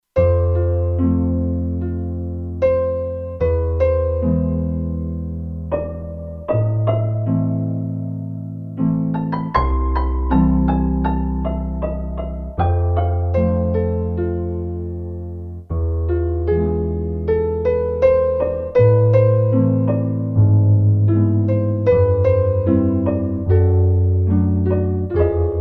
Way Dark Piano, Kurzweil K2500X; Factory Programs

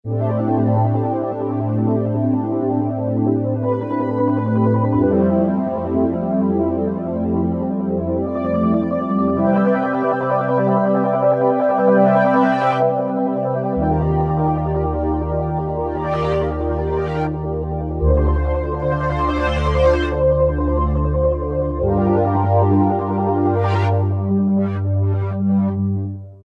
SEQ HORN PAD, Roland SC-880; Factory Patches

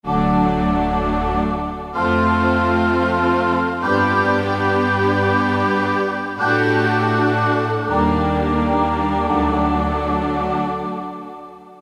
Choir and Strings; Roland PN-D50-01

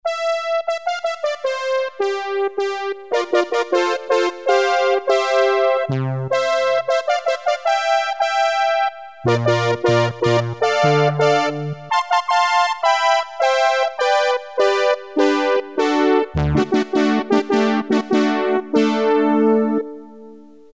BRASS ENS, Casio CZ-1000

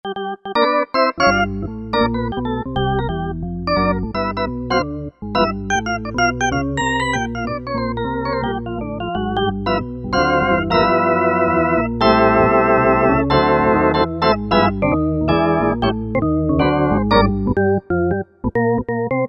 Jazz Perc., Organ

